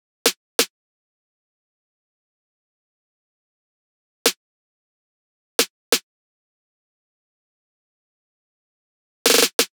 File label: Percs, 90bpm, E